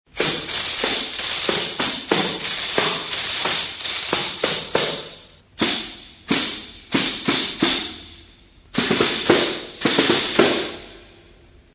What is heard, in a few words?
tambourine; PERCUSSION INSTRUMENTS